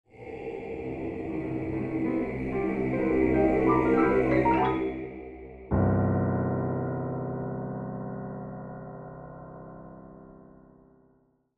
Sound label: Game over you lose creepy dark spooky